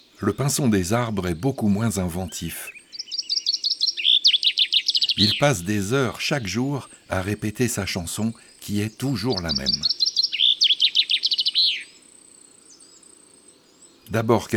Le Pinson des arbres; chants d'oiseaux

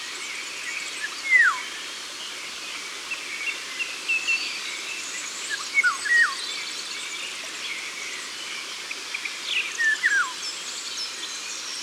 Loriot d'Europe, Concerts Naturels De l'Ardeche

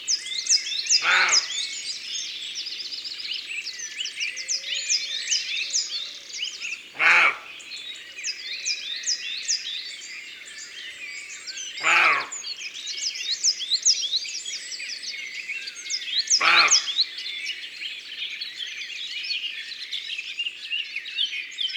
Chevreuil; abc de la nature

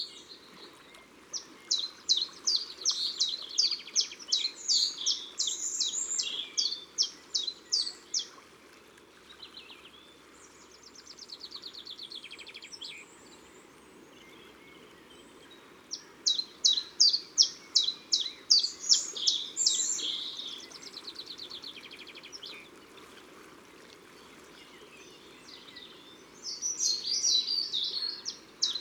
Pouillot Veloce; Ardeche; Concerts Naturels De l'Ardeche

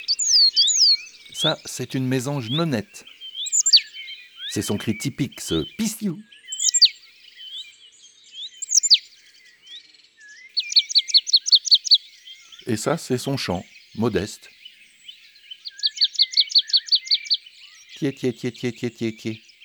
Mesange nonnette ABC des chants d'oiseaux

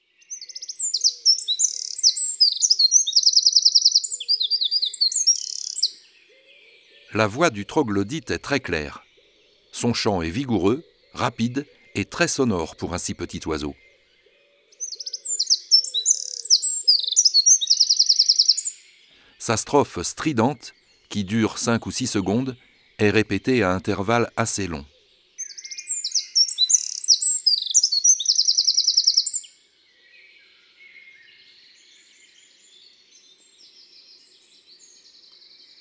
Troglodyte mignon

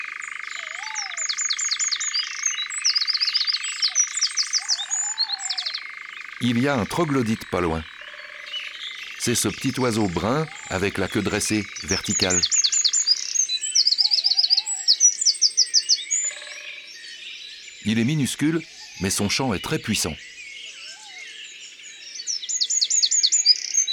Troglodyte mignon; abc de la nature

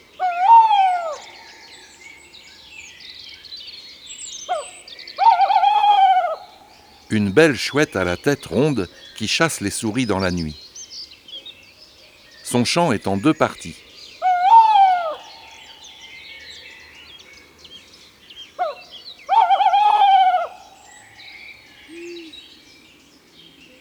Chouette hulotte, Un jour dans la foret; Imagier

